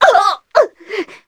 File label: succubus hurt strong; queen girl